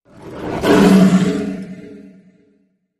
Animal Stinger Big; stingers & whooshes

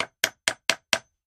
Hammer Nail Wood; hammers

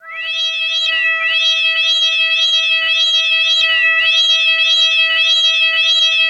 Arp Scanner Whirrin; scanners

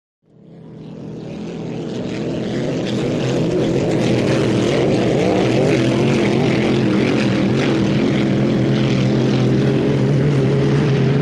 Boat Racing Amb, motorboats

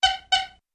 Old Car Horns Single; Various Old Car Horns - Singles & Doubles - 8 Types ( Beeps, Honks, 'aaooga', Etc... )

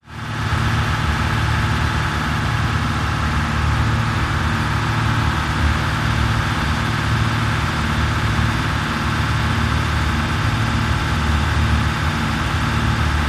marine engine idle; engines

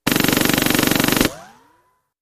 Fast Silenced Machin, silenced

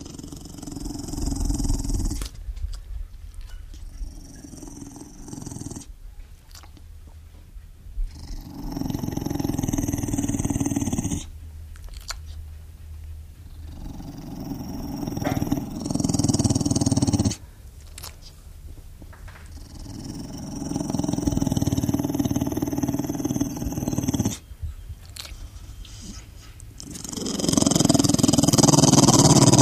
Pit Bull Quiet Threat

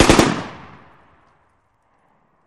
Machine Gun Sngl Burst; bursts

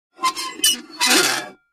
Metal Scrape On Metal

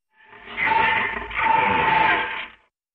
Metal Nightmare Scrape, scrapes

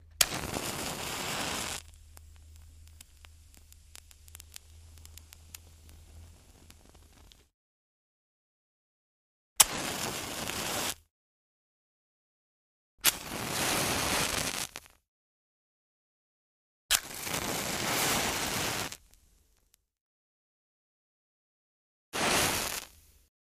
Box Matches Ignition; lighters & matches

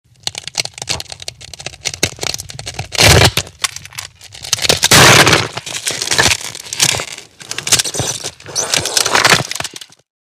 Ice Cracking Large Cr

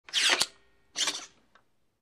Computer Chip Insert

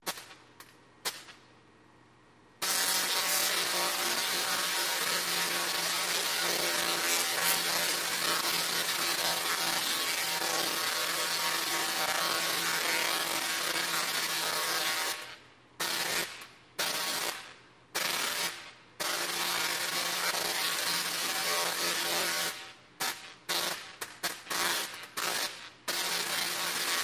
Zaps Electric

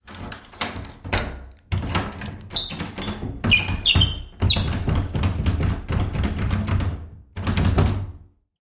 Door Skeleton Key

